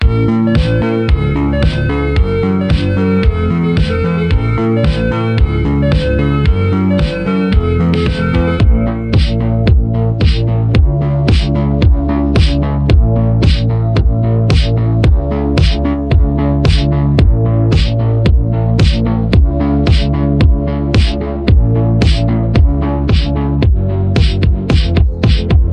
Instrumental

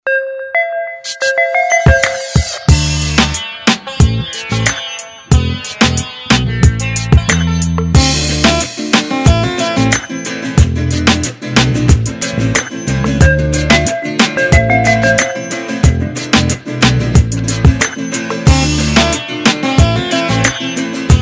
Ringtone; Bouygues Telecom; India

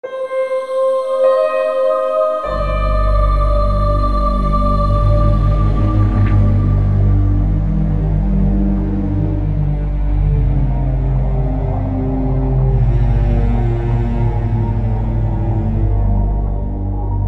Round Start Montage; Call of Duty Black Ops II Zombies; Mob of the Dead

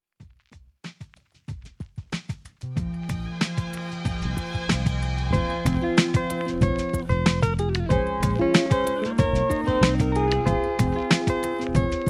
Musique Ringtone; SFR

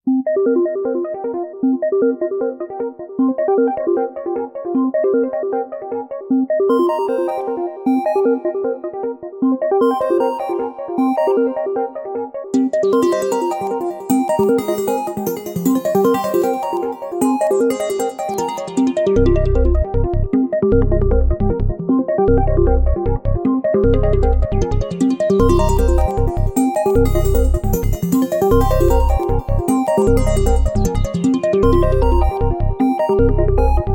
Electro Whirl; Don't Press The Bottom 4 OST

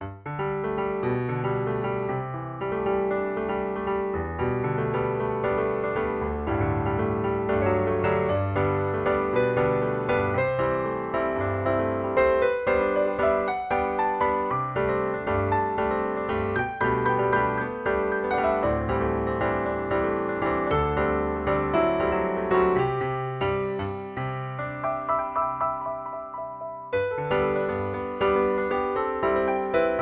Hotline Piano; Free Mobile

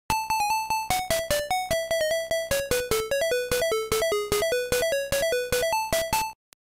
Fanfare Victory; Tetris (Game Boy)

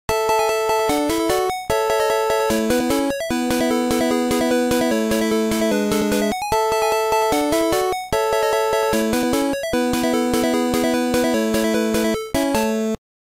Fanfare Victory; Tetris (Game Boy)

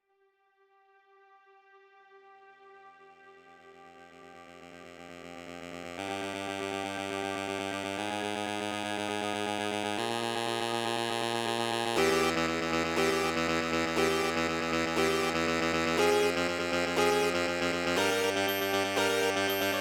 Startup; Black Hole Core; V12

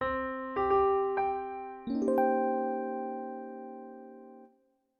Jingle SNCF; Sixième Son; SNCF 1992

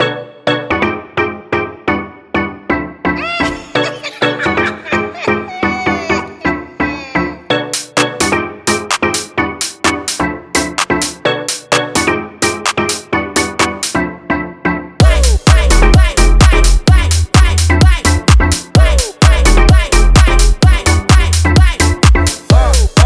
RALLY FUNK

